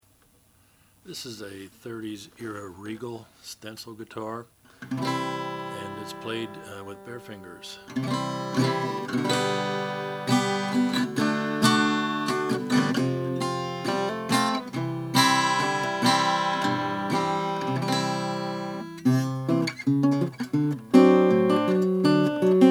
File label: regal volcanoes natural regal volcanoes